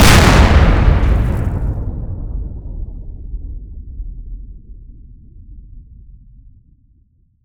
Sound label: explode